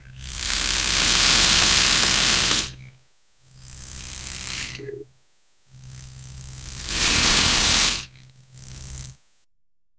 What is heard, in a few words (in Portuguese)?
som de meleca se descolan; texture